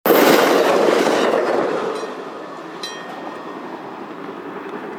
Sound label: train last car